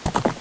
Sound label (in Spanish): Epona pasos; RPG Maker; Legend of Heroen v1.20